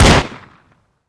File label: glock fire, pistol; midnight guns